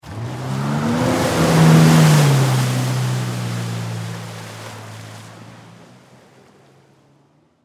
Jet Ski Away, Watercraft; Transportation; Boat Sound Effects; Boats (Sound Effects:Vehicles); BlastWave

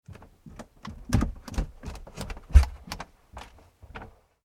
human footsteps wooden stairs; Footsteps On Wooden Carpeted Stairs - Recorded Underneath Staircase; McKinney Sound

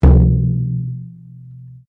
Bodran, Irish styled flat drum; Drums; Musical

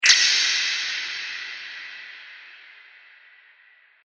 Ambient Click Sound

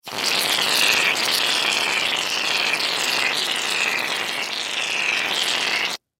Mousse Can Spray Long; Foley; Hair Salon Barber Household; Primping; Human

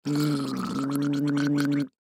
Human Gargle, Gargle Short Element Male 20 Yrs; Gargling; Blastwave

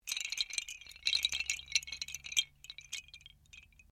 Windchimes Bamboo Small Short

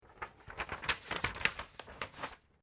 Paper Sheet Movement Fold; Material components for constructing more complex sounds; Sound Effects:Materials

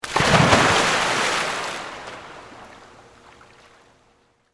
Pool Indoor Dive, Water, Splash; Pool Sound Effects; Pools (Sound Effects:Backgrounds); BlastWave

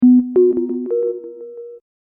Hip Hop Synth Licks 90 Bpm B Swing; In-The-Dark Straight Var B; Royalty Free Synth Loops

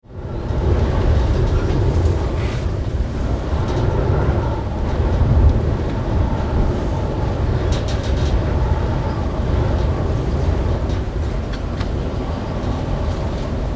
Storm Wind Interior; Gale raging with lots of wind element levels - some interior rattles and movements; Weather

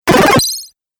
8 Bit Aggresive Laser Bleep

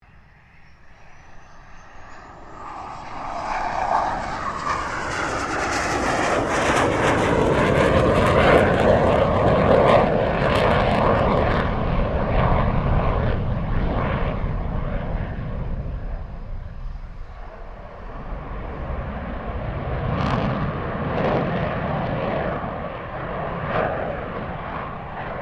FA-18 Hornet pass; RAAF FA-18 Hornet; Airplanes

